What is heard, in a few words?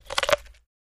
Corn Cob Bite